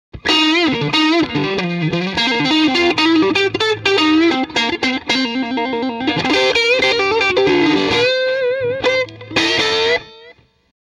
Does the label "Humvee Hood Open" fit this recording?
No